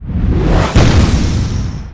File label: skill shot fire; SkillSound